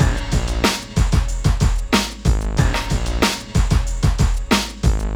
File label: Funky Beat Groover; Sonic Foundry (Sony Creative Software); Ugly Remnants VOL-2; beats